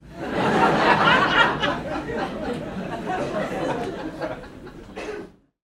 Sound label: human audience laughter comedy club x200 people